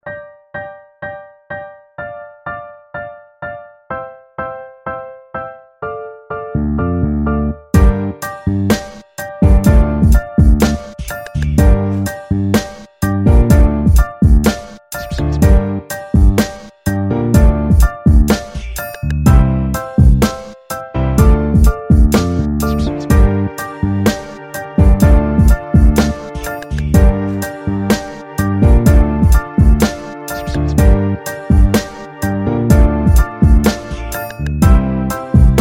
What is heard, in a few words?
old school rap hiphop beat 125 bpm